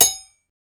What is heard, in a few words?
metal